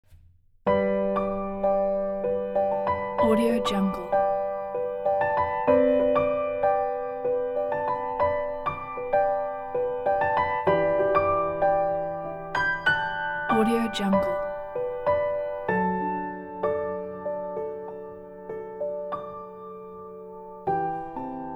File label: spring romantic piano